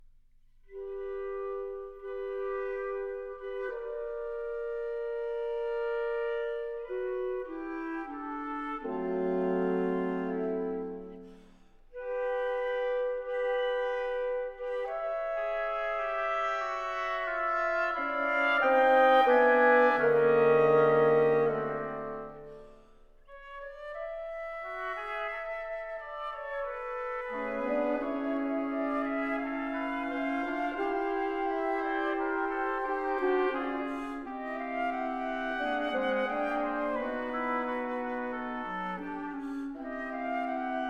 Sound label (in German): Kleine Kammermusik fur funf Blaser